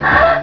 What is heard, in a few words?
HIIAH; HotLeather2